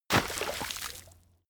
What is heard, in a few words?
body fall water swallow; krieg-waffen; nahkampf; soundpool